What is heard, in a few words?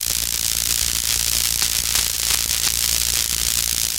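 Electric Arc Sizzle Mid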